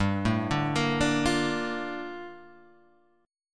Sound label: Bb Major 7th, G; Bbmaj7/G; arpeggiated; JGuitar